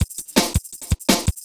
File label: Beat, Futurist Drum & Bass; Drums & Percussion